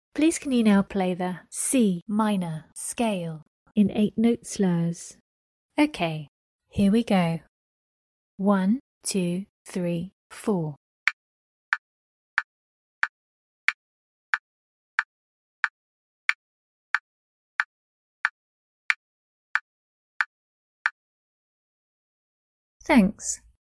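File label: C minor scale 8 note slurs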